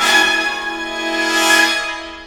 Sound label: zap sample, orchestra hit; Greytsounds VOL-1